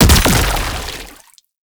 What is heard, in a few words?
Grenade Explosion; Weapons; Scaldra